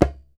DJEMBE; E-MU Producer Series Vol. 7 – Old World Instruments

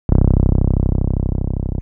TB 303

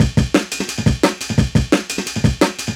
DNB BREAK; m8 breaks

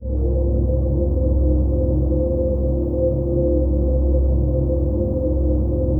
ATMO PAD, Dance Mega Jungle Rave; Best Service

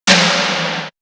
Tom, Drum One Shots

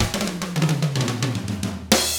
110 SAMBA, Drums; ILIO; Double Platinum Drums 1

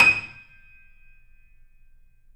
D#, The Piano; InVision Interactive - The Piano

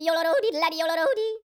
YODEL, World Voices; ILIO; Vocal Planet

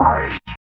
OWAH; East West - Phat & Phunky CD2; 90's Sample CDs

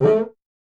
Roland Juno D Perc Menu Kit

